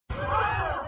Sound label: crowd gasp